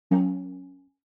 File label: OS Sound Notification